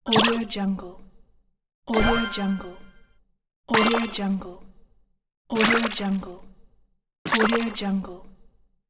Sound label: puzzle game stars complete level